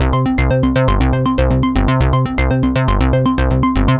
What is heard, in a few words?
Synth